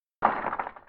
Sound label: unhinge marble; Apotheorasis